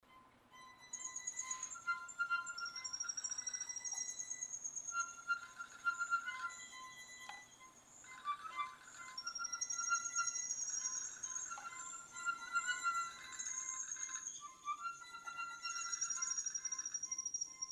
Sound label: CRICKETS